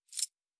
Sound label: Keys; Survival Game Sounds - Lite Edition